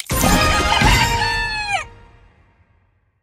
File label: win